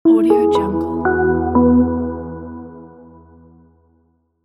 clean deep logo music

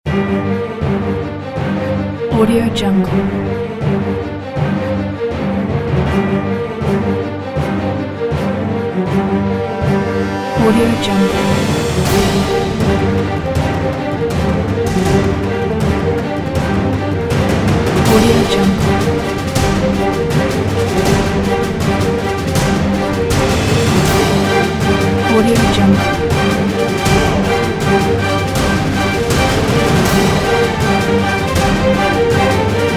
intense cinematic intro

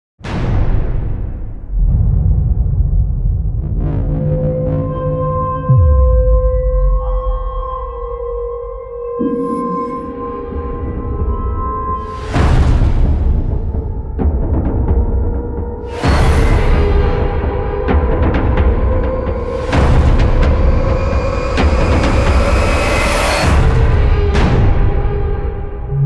simulacrum sound fx; zero g

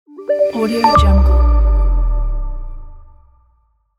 fast podcast logo music

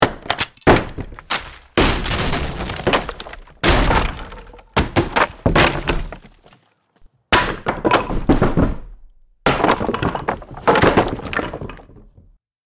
wood impacts sound fx; big room sound

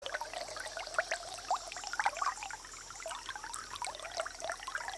riparian zones; stereo and surround edition; boom library

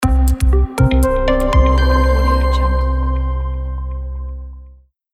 abstract clean logo music

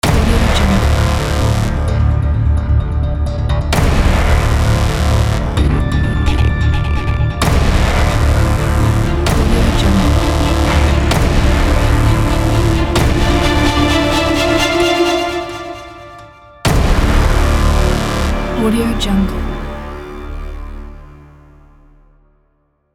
cinematic logo ident

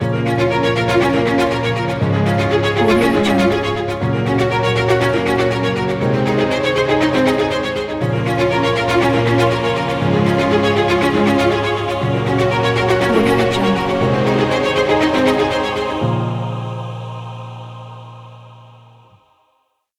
drama award epic logo music

